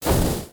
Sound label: ranged impacts; terrorblade